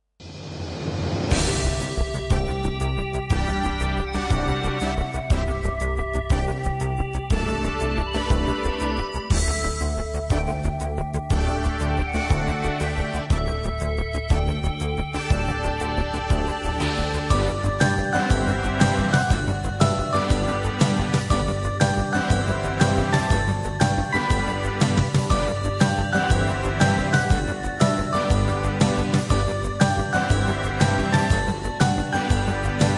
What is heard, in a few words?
Fanfare